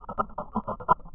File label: rattle C